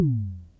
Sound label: SYNCUSSION